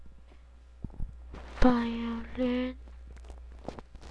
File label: violin